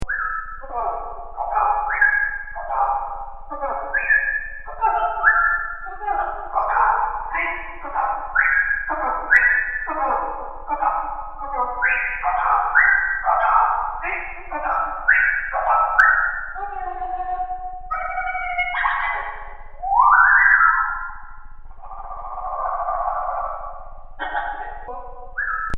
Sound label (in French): le reveil tranquille, sonneries portables